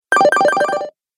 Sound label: Retro Videogame Fx Tag Hit